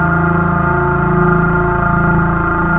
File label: machine; Libra